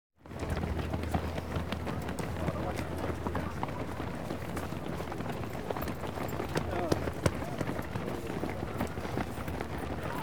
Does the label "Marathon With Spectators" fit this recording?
Yes